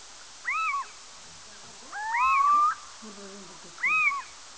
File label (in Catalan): mussol